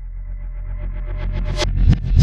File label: drbreak, drumbreaks; drumloops; Masterbits; Vinyl Attack